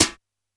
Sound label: Brush Slap, Standard Kit, Yamaha PSR-E323